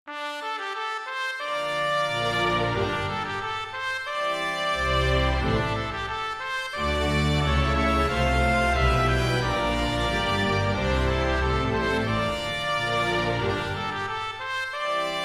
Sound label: Off Vocal